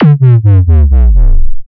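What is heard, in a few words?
Kick Slowdown Distortion Gate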